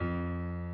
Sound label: key; keySounds